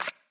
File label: card slide